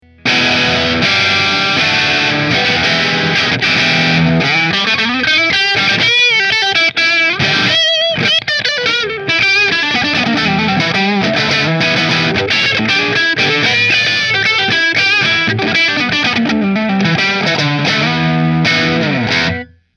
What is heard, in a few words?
Nola Voodoo Green Neck Marshall; New Orleans Guitars Voodoo Custom Dark Green